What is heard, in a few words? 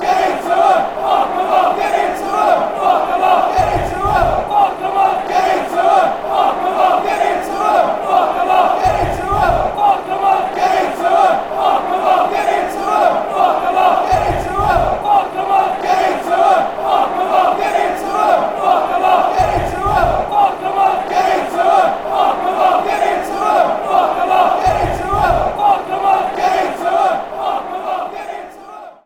get in to them, fanchants